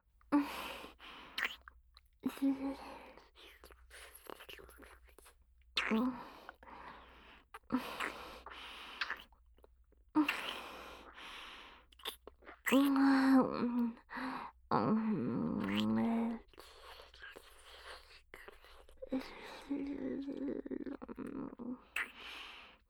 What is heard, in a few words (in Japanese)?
キス, ASMR